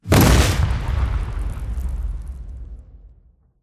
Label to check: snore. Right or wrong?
Wrong